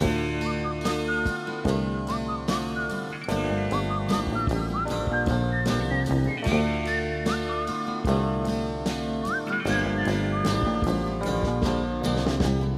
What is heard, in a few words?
guitar, Loops